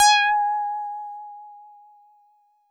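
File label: Mono Bass DX100 G#4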